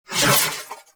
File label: Proj Hip Whoosh; Weapons; Warframe; TheNewWar; Omicrus